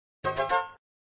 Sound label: Fanfare; flipper